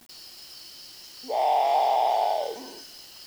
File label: exotic, Birds of North America